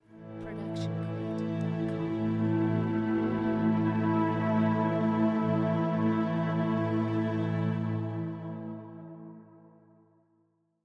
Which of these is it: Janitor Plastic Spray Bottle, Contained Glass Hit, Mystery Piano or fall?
Mystery Piano